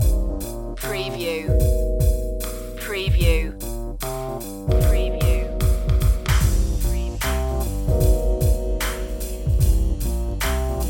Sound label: blue funk loop